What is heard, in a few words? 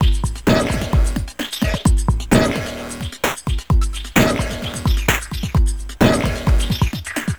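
Messup Loop, beats; Sonic Foundry (Sony Creative Software); Esoterik Beatz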